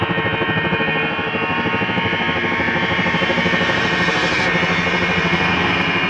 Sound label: sci fi; Sonic Foundry (Sony Creative Software); Ugly Remnants VOL-2; UR 2 FX (Long)